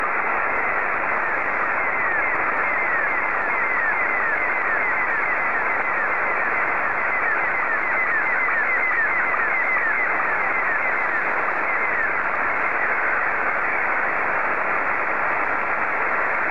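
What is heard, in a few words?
SSTV, Scottie 2; JA3OEN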